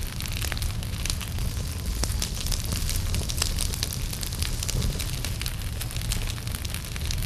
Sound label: fire loop small crackle; colony; Titanfall 2